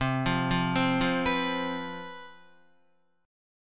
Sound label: C Major 7th Suspended 4th, down, Guitar; arpeggiated